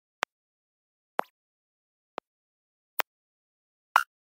keypress

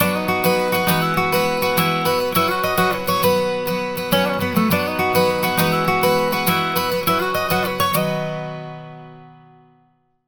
Cowboy

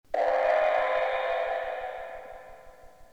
gong

